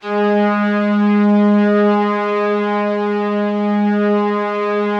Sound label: G#2, STRINGS; Yamaha VP-1; Music Store; JODYSTRINGS